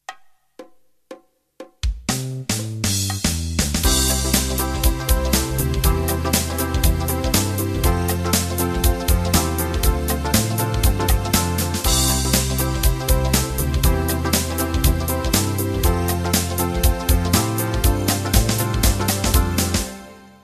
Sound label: Fusion Intro; Fusion 10